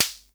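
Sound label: SR95 snare drum; Best Service; Dance Mega Drums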